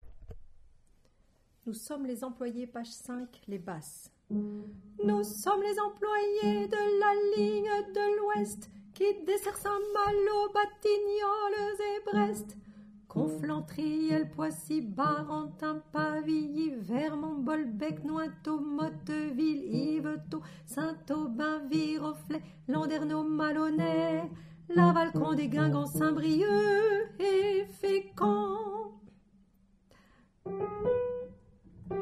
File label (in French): Basse